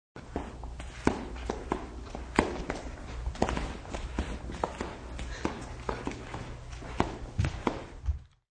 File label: Walking in flip flops on carpet; Flip Flips Flops on Carpet; Footstep Sound Effects; Sound Effects:Human